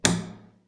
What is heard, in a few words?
metal